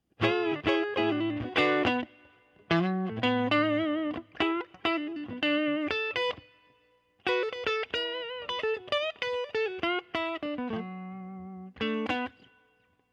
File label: s 5 scooped mid middle, pickups